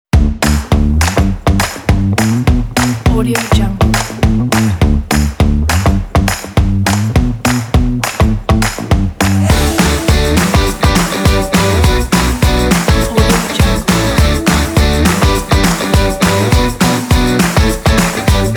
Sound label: be happy